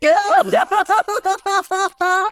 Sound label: crying